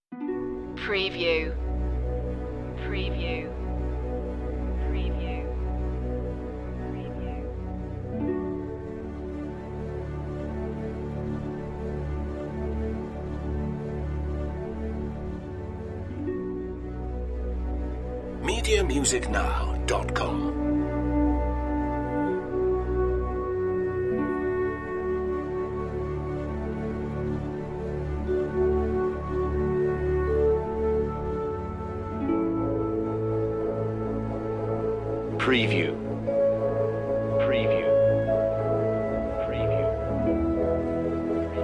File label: my mind is yonder no drums